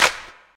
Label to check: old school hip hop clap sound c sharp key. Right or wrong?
Right